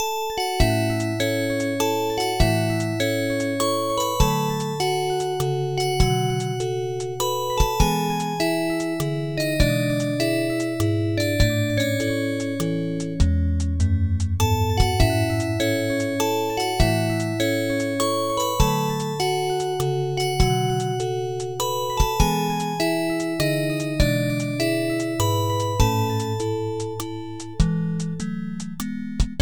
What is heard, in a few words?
Instrumental